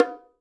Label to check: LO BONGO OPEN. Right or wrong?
Right